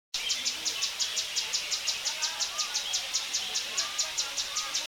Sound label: grey headed flycatcher